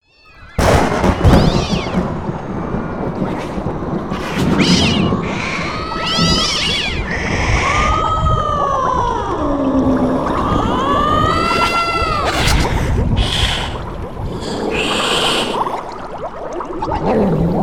howling cats; Halloween Nightmare CD Sound Effects

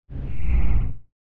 Whoosh Ultra Low Rumble, Drone - Short; Hollywood Edge; sound designer's toolkit v2

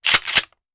Shotgun Foley; Sound Ideas; Guns

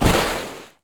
Bodyfall Beast On Dirt; SFX Kit; Bodyfalls

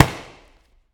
Boxing Slap Stick

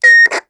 Walkie Talkie Feedback; Communications; 1001 Sound Effects

